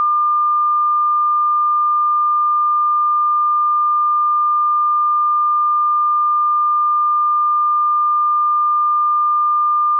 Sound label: Midrange 1200Hz